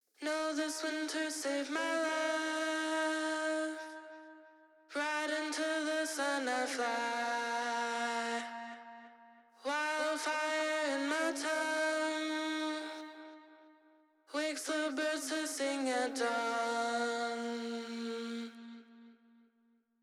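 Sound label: Disto Vox REPLIKA Stereo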